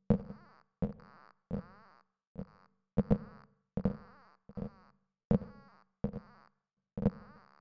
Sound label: synth creaking wood plus reverb; The NIW Project